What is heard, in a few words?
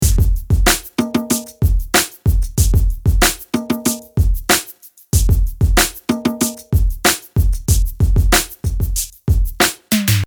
Hip Hop